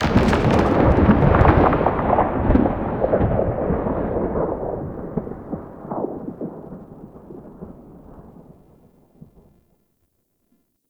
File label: Paratrooper Plane Fly Over Start; Warframe; Lasrian Soldiers